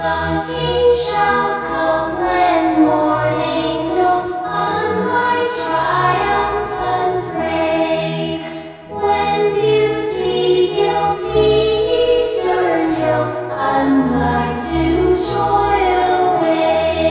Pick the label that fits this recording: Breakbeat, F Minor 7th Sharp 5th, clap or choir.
choir